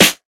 crispy snare drum sample a key, snare drum sample tuned to the A note